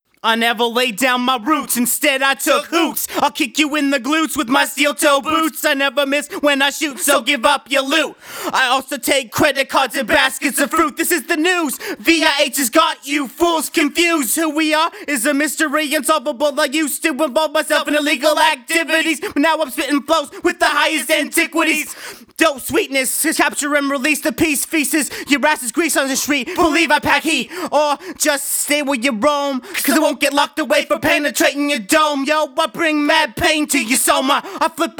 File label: acapella